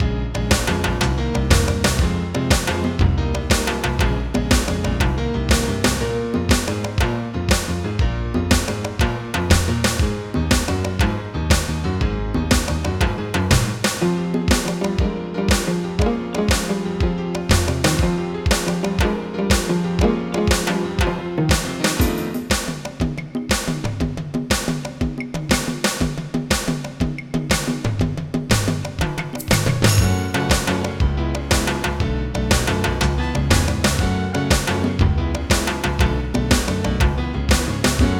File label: CUBAN; Gold Medal Software - Volume 5; music